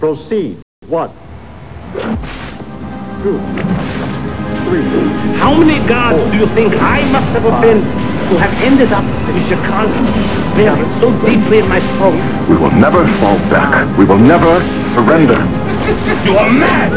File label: whip